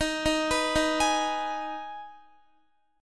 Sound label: Eb Suspended 4th Sharp 5th Eb Guitar 4F 4A 5C 5G 5C 6E; Ebsus4#5 arpeggiated; JGuitar